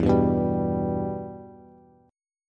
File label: E-Minor, Bass; strummed; JGuitar